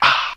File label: ah WHISPER; ooh ahh